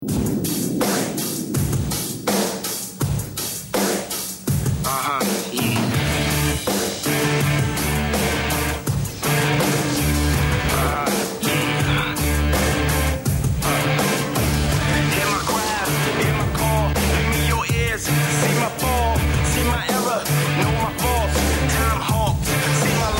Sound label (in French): Générique TV; Télé foot; TF1